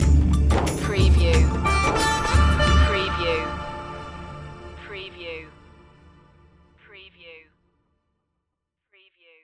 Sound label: snake charmer stinger